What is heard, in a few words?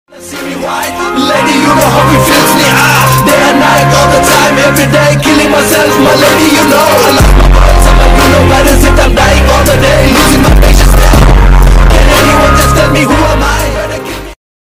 breakup ringtone; arjun reddy